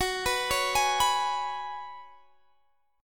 F sharp Suspended 2nd Suspended 4th, Guitar Standard; arpeggiated; JGuitar